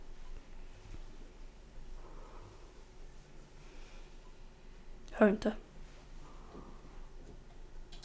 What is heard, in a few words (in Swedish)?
sang